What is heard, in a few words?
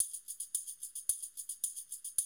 110, Classic Drum Loops